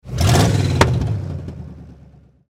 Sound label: Car Pop Rev; Transportation Automobile; Car Sound Effects; Cars (Sound Effects:Vehicles); Blastwave